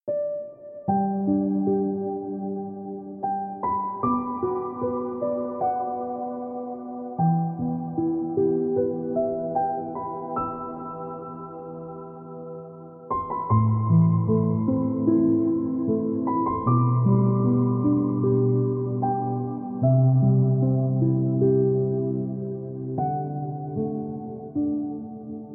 Piano Version

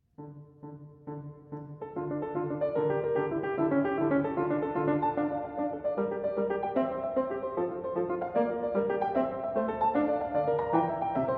Prelude & Fugue in D Minor, BWV 851 I. Prelude

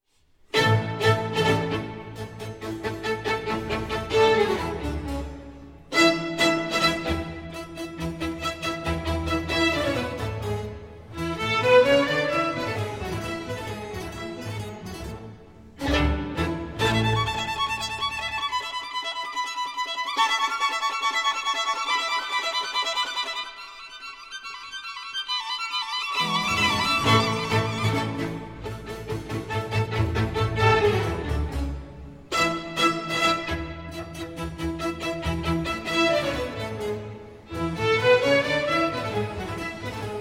Concerto For 2 Violins in A Major; L'estro Armonico No. 5; Vivaldi

